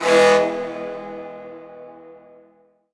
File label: foghorn; Splashdown; XBOX; Soultree; LHouse